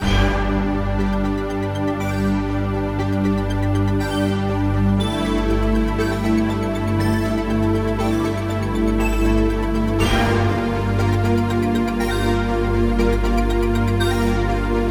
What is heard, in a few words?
countdown, tones